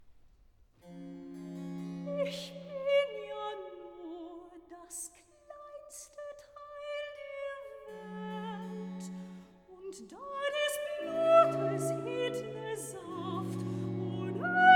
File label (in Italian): Recitativo (Sopran); vocal; soprano; BWV005; Ich bin ja nur das kleinste Teil der Welt; BACH